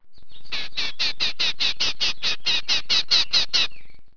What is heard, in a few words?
GNATCACH; Webster's International Encyclopedia 1998